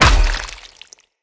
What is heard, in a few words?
Polearm Large Impact Flesh; Weapon Impacts; Enemy